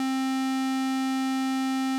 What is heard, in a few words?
Minimoog SQUARE